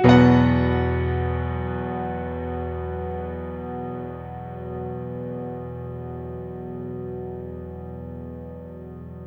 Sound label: Piano stack (E) maj; Sonic Foundry (Sony Creative Software); Ugly Remnants VOL-2